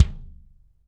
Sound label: BD DRY, Drums; Best Service; Real Mega Drums